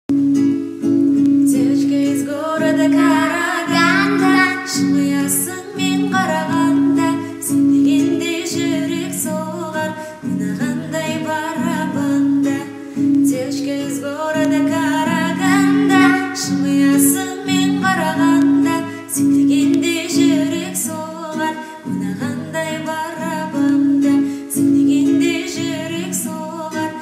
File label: gitara